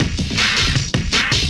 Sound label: Loop, Drum & Bass, 160 BPM